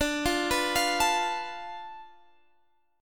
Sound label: D-Minor 6th, D, Guitar, Standard; 22,20,21,22,22,x; Dm6 arpeggiated